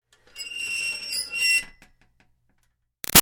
Screech Metal Iron Board Slow Stutter; Ironing Board; Painful; Materials; Big Room Sound

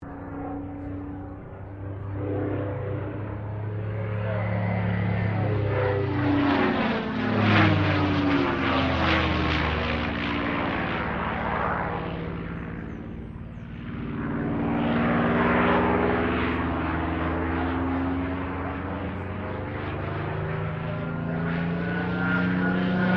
AIRCRAFT CAC CA-18 MUSTANG MK23 LOOP; CA-18 /P-51 Mustang; Airplanes; Vehicles; Aircraft Collection; Twistedtracks

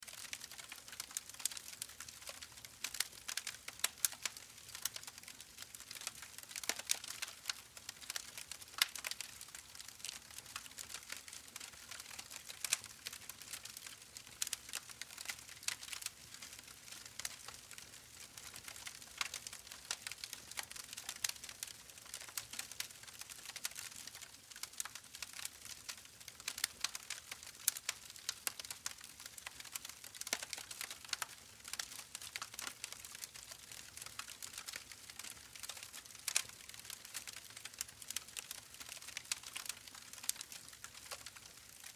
Campfire Camping Wood Crackle Loop; Small; Flames; Flame Sound Effects

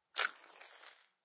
Match flame; Flames; Objects

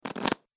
Cards Fast Pack Flick; Card Sound Effects; casino sound effects

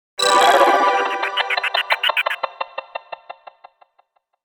Chopper Synth Stutter Glitch; 5.1 STEREO MIX; Blastwave

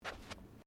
Footsteps Bare feet Carpet Step; Footstep Foley; Human

